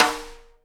SN RIM RING, Live Snares; PlatinumPhattCD1; E-MU Producer Series Vol. 8 – Platinum Phatt

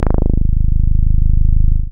BAS MINI, MOOG; Sound & Vision - Gigapack II CD 1

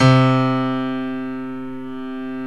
GRAND PIANO; OMI - Universe of Sounds

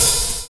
BOOM TAC; Univers Sons

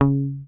HOFNER MUTE, Bass

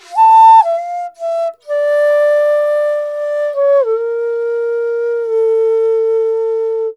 INDIA ALTO

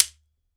SHEKERE, African Perc; E-MU Producer Series Vol. 7 – Old World Instruments

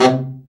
BRA BARI, Bariton Sax

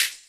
Hhat, Hihat; Equipped Music; Loungin House

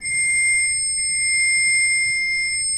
SWL C6, Cathedral Organ, SCHWELLWERK; Propeller Island

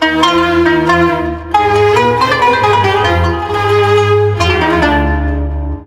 Oiental; Best Service; Ethnic Journey Sound Cube

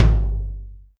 tom lo, Alesis DM5

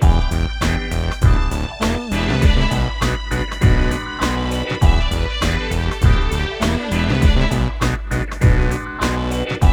100BPM, Hip Hop; InVision Interactive; 40 Oz Phat Slammin

